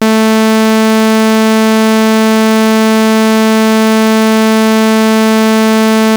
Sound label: waveforms sawtooth